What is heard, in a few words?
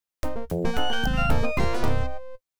Disco